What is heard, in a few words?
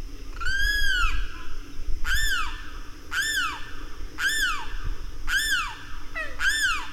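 kreow, Limpkin; National Marsh Bird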